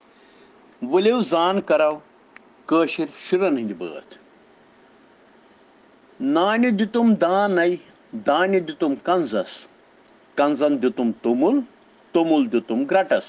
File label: Children Rhyms in Kashmiri